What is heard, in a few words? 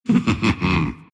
laugh; dota; oracle